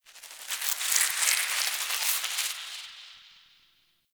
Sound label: Cave Squelch Bat; Warframe; Tau